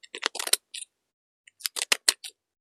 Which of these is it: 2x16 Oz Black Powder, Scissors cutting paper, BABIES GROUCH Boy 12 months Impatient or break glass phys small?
Scissors cutting paper